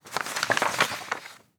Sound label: email papers